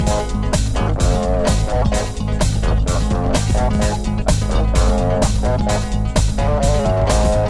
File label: horny horns, grooves